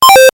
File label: se cancel, 8bit